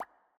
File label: Bubble Large Pop